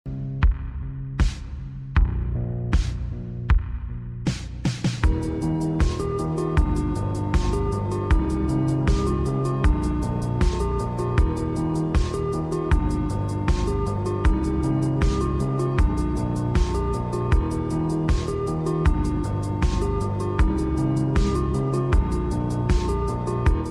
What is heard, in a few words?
calm music; for sleeping